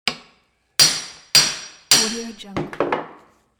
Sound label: hammer hitting metal in shop